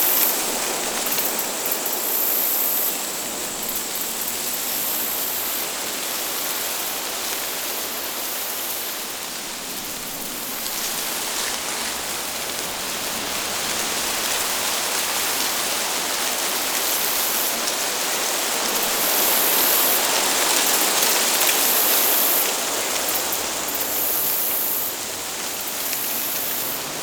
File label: Exterior Foreground Forest Loop, ambience; Duviri